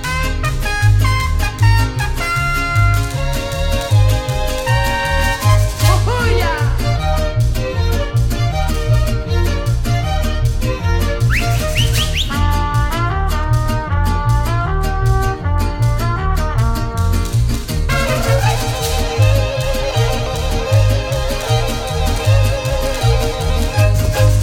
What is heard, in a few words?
rooftop music loop; The Adventures of Puss In Boots - Barrel Run; NextlevelGames